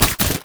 minefield land; LoL; ziggs madscientist

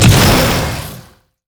bramble zone splash; LoL; zyra

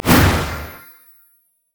item leviathan max buff; LoL; items

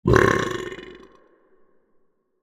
drunken rage burp; gragas

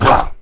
ravenous basic attack; LoL; yorick

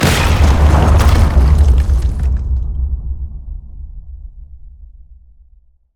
turret break; chaos turret

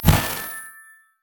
sow the wind cast marker; LoL; janna

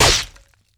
wolfman vs flesh; LoL; warwick

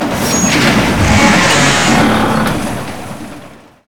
prop claw animated; odin

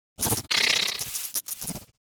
young lizard bored; LoL; monsters

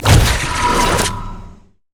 gate start; LoL; twistedfate

